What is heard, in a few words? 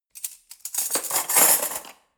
cutlery forks drawer place